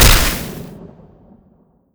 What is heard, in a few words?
shockwave projectile end